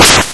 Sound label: Hard PCM Chip; chiptune